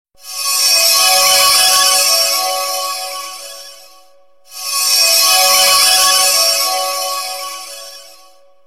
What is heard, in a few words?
Chime Sms